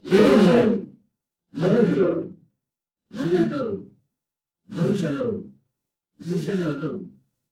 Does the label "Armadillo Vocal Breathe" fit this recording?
No